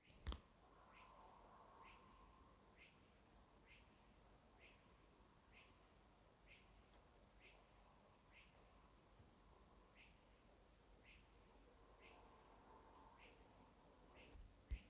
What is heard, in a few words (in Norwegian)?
ukjent fuglesang